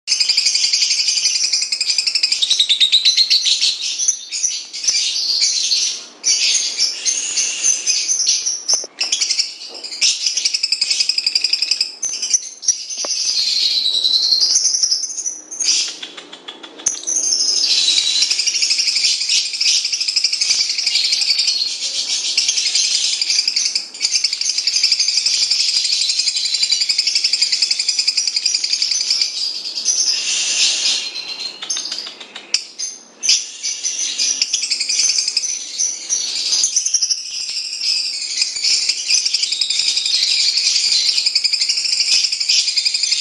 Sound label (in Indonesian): lovebird lutino ngekek; masteran